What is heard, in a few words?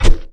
Clay Shoot; Risk of Rain